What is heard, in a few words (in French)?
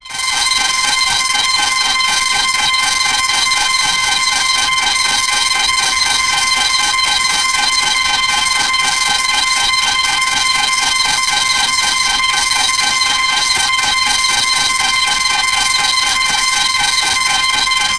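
machine en fonction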